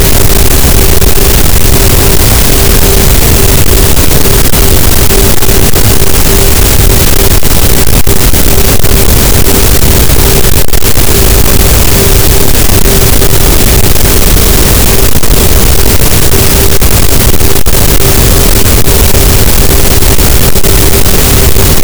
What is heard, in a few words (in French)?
cachalot positif, Physeter Macrocephalus, gaussian noise